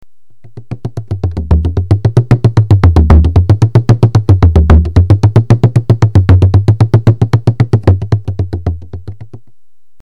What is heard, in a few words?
basic jig